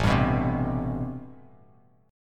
A-Minor 9th A Bass Standard; strummed; JGuitar

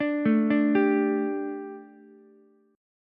G Suspended 2nd G, down, Ukulele; Gsus2 arpeggiated; JGuitar

